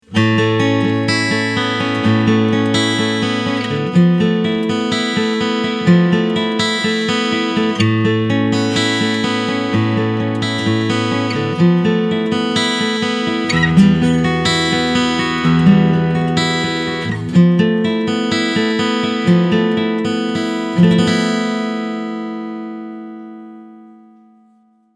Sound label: Ibanez AW80CE Mic